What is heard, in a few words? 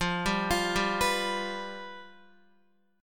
F Suspended 2nd Flat 5th, Guitar; Fsus2b5 arpeggiated